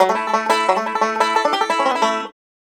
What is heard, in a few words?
COUNTRY